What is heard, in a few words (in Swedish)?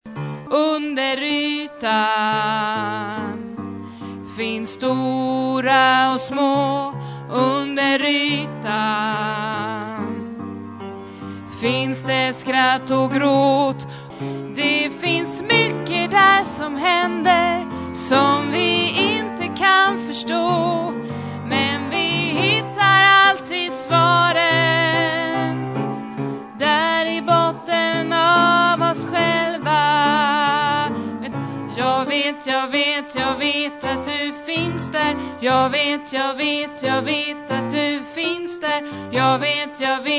alt, stämmor